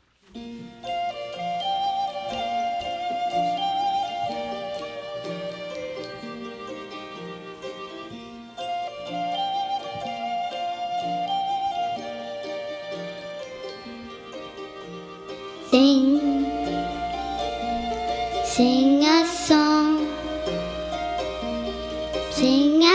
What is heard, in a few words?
Sing; BarnYard Ruckus 2017